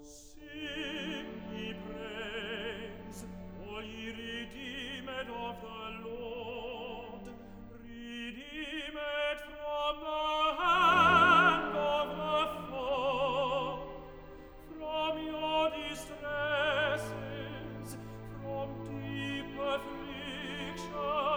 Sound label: Symphony No. 2 in B-Flat Major Rezitativ; CBSO; Edward Gardner; Mendelssohn; Op. 52, MWV A 18 Lobgesang; IIIa. Rezitativ. Sing Ye Praise, All